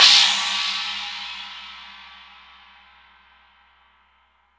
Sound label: CHINA, Drum Dry; East Collexion; Drum 1 Dry